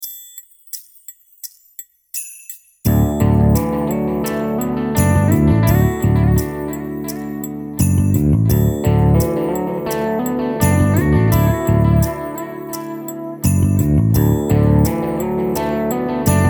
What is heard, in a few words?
Loop dreamy guitar